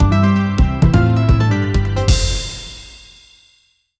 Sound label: short rhythmic jingle fo; animation